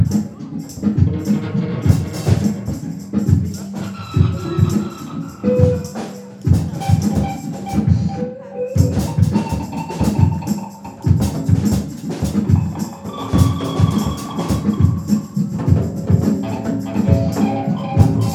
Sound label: guitar screetches